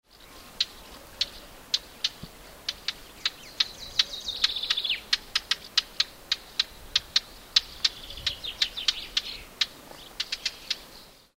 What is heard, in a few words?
subalpine warbler